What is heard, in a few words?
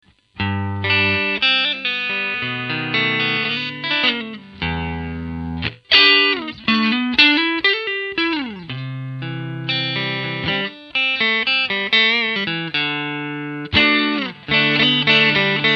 crunch